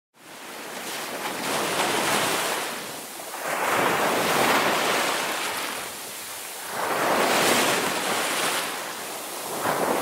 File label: ocean waves crashing on beach